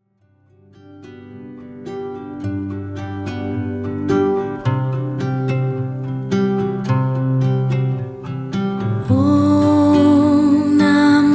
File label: Om Namo Narayani, kirtan